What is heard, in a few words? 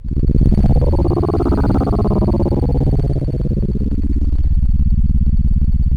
C#, Synclav FM; Big Fish Audio; SPACE1